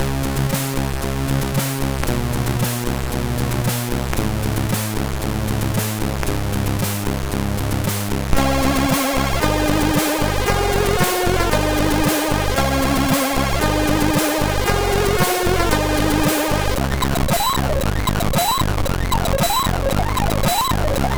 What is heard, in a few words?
March, MOS6581R3